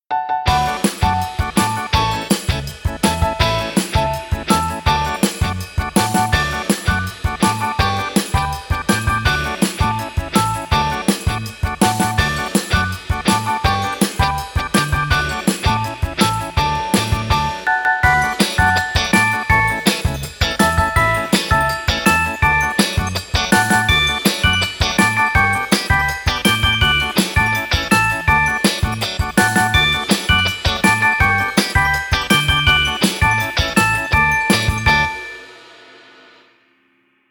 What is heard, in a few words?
Happy Birthday To You Upbeat